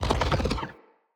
Fire Rain Ground Rupture; Powers; Fenn

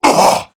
Pain, Male

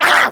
Attack Effort; en; Grineer Jester; Battle Barks

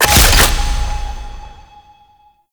Modifier Pickup; Warframe; Nineteeen Ninety Nine; PvPvE

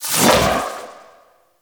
Doom Sword Whoosh B; Excalibur

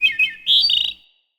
Forest Open Glade Bird Close, Day

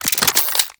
Briefcase Akimbo Pistol Reload; Warframe; Corpus

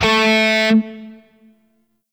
Shawzin Oct Two A; Warframe; Grineer Shawzin

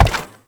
Step Perforated Metal; Warframe; Uriel

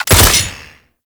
Ghoul Sawman Shot; Warframe; Grineer Ghouls

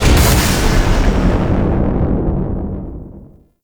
Rail Jack Ship Banking A; Warframe; Vehicles

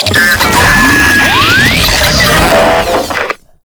Proto Infested Brachiolyst Idle, Vocals, Enemies; Warframe

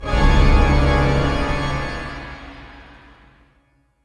Organ Shot; Encounters; Ode Pollution Tower

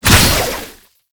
Alt Fire Tether; Weapons; Warframe; Tenno; Oraxia

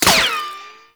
Exalted Sniper Ricochet; Warframe; Frumentarius

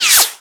Bullet Whizzby

